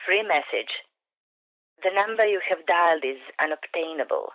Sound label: phone error; devices accessories utilities; NS Studios - ultra sound collection